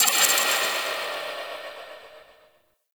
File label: Spring Bounce; Ambience; Warframe; Corpus Railjack